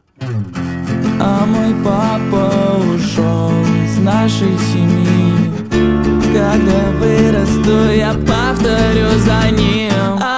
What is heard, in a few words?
semi acoustic version; instrumental